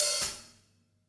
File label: Hi Hat Open Close, acoustic kit